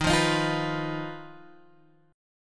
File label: Eb Suspended 2nd Sharp 5th, Guitar, Open G